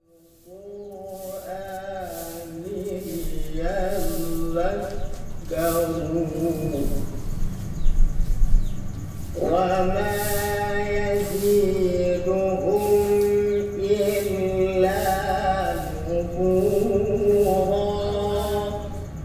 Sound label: Male Sing Mosque, Indonesia; Les Cartes Postales Sonores; NOISE ON EARTH Vol5